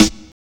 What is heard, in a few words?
combi drums